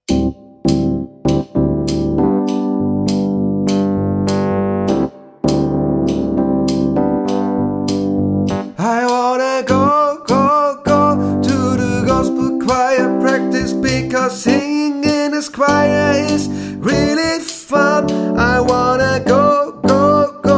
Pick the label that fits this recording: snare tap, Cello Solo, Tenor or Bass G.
Tenor